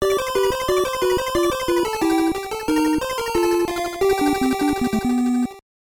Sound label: Game Over; wai wai world 2